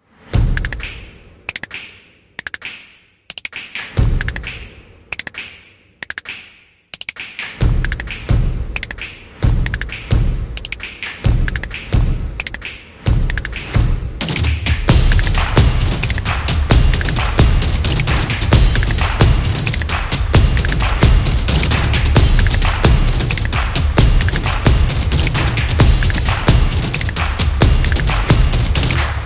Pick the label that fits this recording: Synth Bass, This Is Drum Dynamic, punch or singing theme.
This Is Drum Dynamic